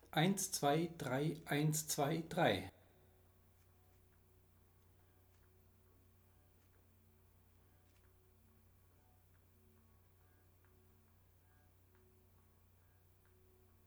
Stimme C; Reimlingen; Respighi

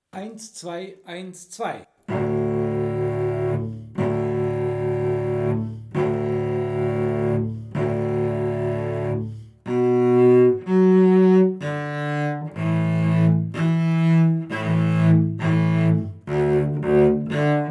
Stimme D; Reimlingen; Respighi

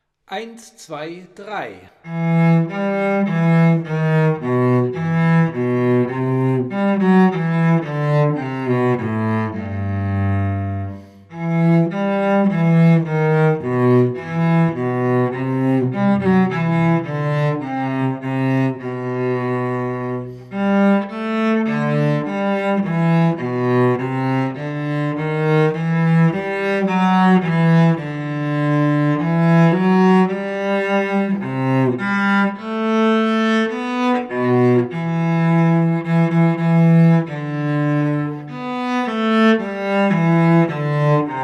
God Rest You Merry Gentlemen Begleitung

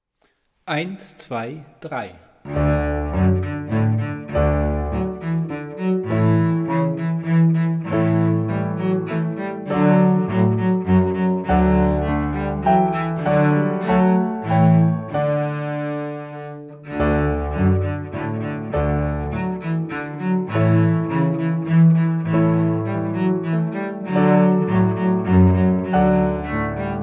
Fanfare Melodie und Begleitung; Corrette

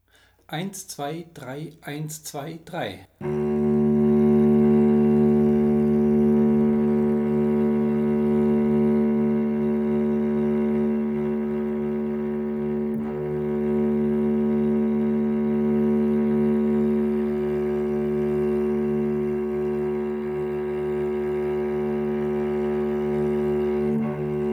Stimme E; Reimlingen; Respighi

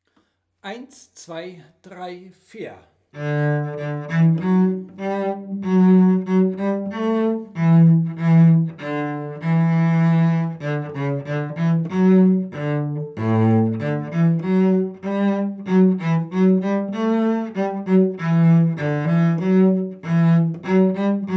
Stimme C; Reimlingen; Respighi

